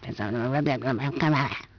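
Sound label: female angry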